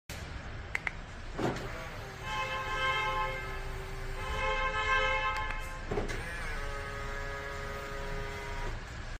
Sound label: Electric car mirror; آینه برقی ماشین